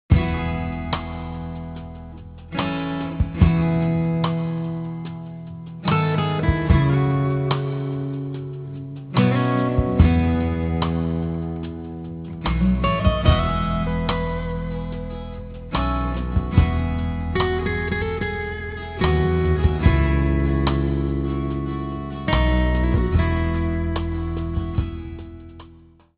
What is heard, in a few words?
guitar